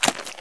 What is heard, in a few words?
mud